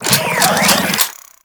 Reload, Infested Dart Pistol; Coda Tysis

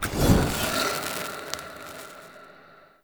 Fire Rain Cast; Powers; Fenn

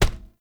unarmed punch impact metal; Weapon Impacts; Player; Environment

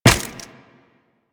Run Ice, Footsteps; Warframe; Tenno

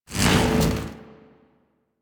Hoverboard Slide Start; Warframe; Vehicles; Venus; anim sounds

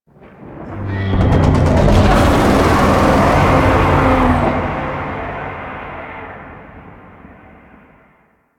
Void Dragon Vocal Distant Idle; Warframe; Duviri

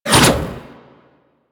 Reaper Scythe Spin Whoosh

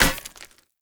Polearm Medium Impact Rock; Weapon Impacts; Player; Environment; Sphere Polearm

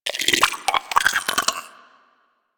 Arachnoid Vocal Grapple; Enemies; Vocals; Warframe; Corpus; Arachnoid Hunger

